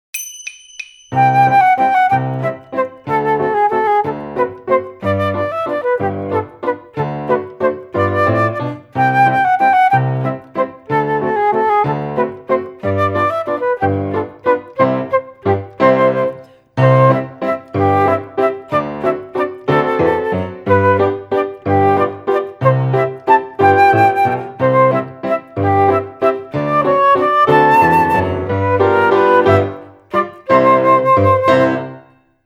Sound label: Oom Pah Pah